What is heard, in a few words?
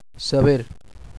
saber